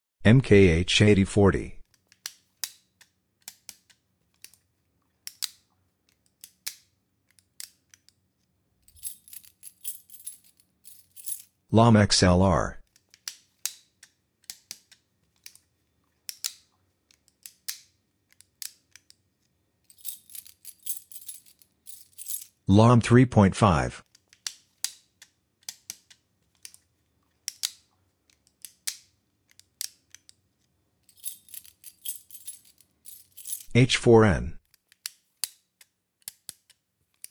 Noise Floor Comparison Transients; aXLsound

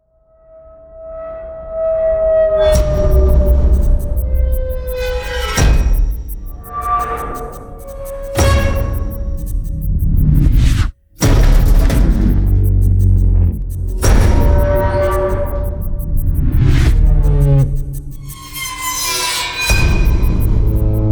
Tortured Metal Cabinet

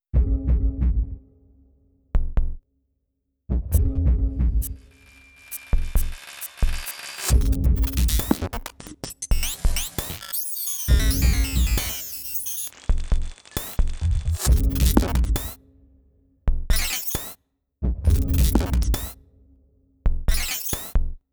Xenon Beat; aXLsound

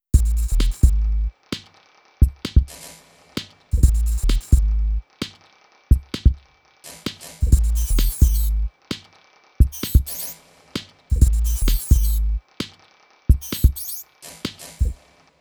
Xenon Beat; aXLsound

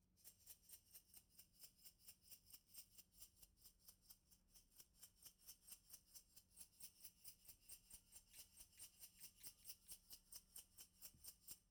SHAKE LENTIL, ORTF; CM3

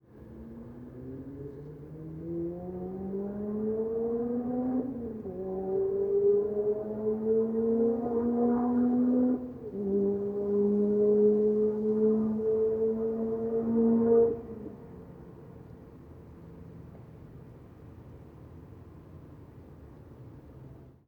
distant motorcycle resonating the valley; ORTF; aXLsound

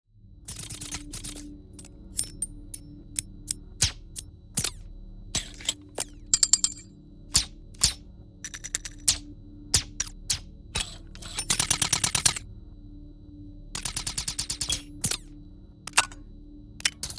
Metal Sweeteners Designed; aXLsound

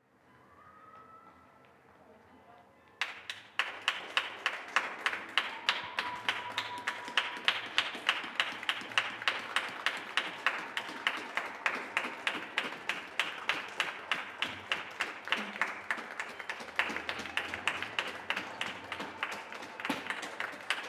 corona clap; WIDE

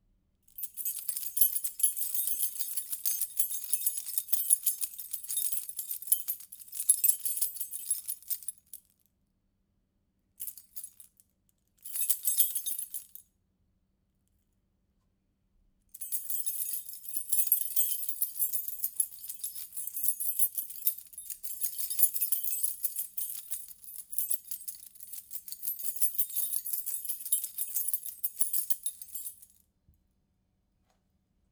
keys, ORTF; CM3

